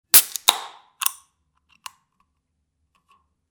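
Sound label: beer opening sound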